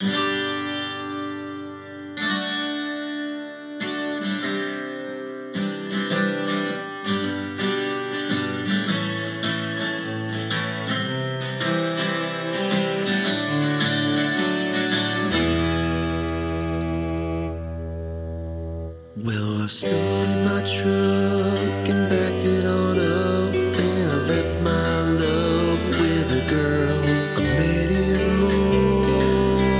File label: harmony karaoke; full mix; music smorgasbord